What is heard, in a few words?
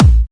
Trance Kicks, Bassdrums